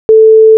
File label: oscillator Node